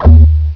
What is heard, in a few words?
gun; Deers Revenge